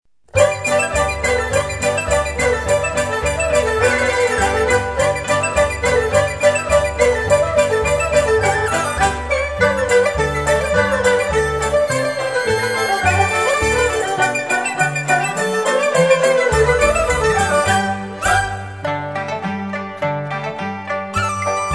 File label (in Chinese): 喜洋洋纯音乐